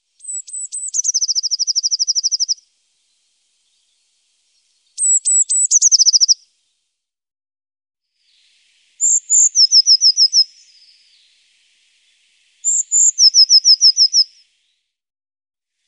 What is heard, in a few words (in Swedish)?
Blames, Faglar